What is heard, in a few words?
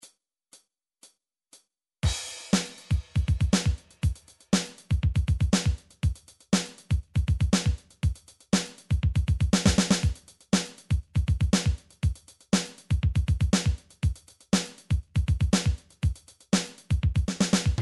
pop, midi; song book